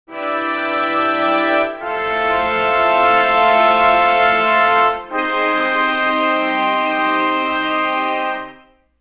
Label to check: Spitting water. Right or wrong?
Wrong